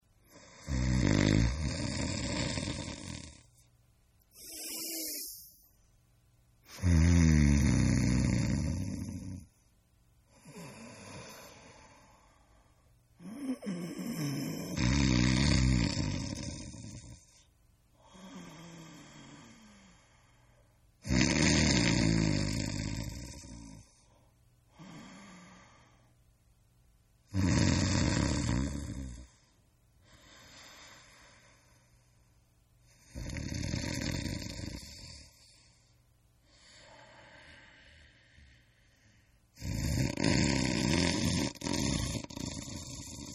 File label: Snore; Partners In Rhyme